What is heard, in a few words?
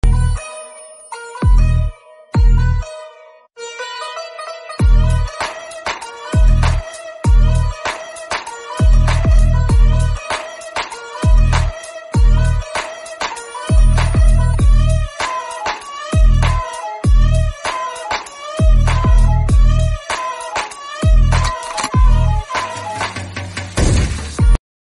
instrumental theme background music tone ringtone